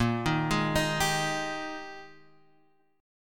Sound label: Ab Major 7th Suspended 2nd Bb, Guitar